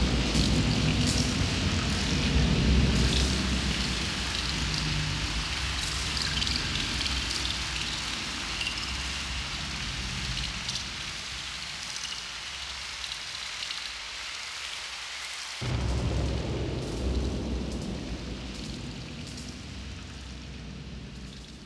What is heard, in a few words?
THUNDER; Best Service - XXL Production Effects